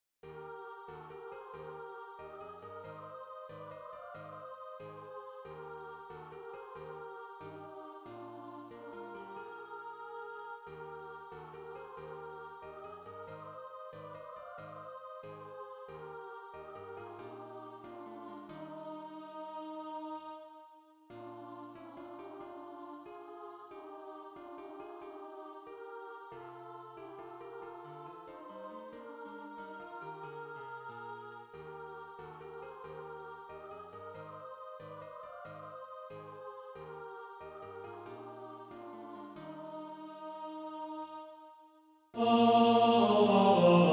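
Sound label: tenor, SATB Practise Music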